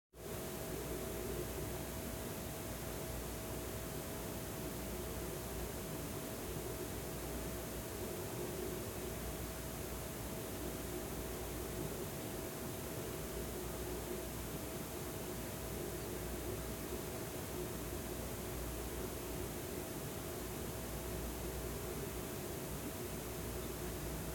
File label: Room Tone Small Sized Room; 5.1 LCRLsRsLFE; 2496SoundEffects; Surround a Room 5.1- Stereo Room Tones